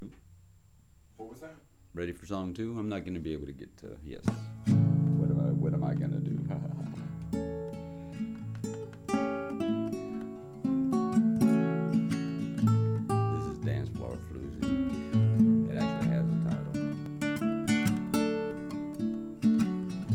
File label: jam dance floor; floozie